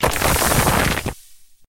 land

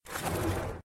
close

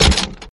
Casket door

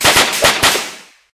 auto attack

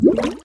Bubble gun

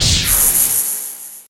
Energy up

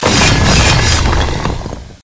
dragon

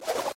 gun roll

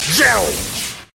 power

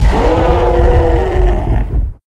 Zombie shoot

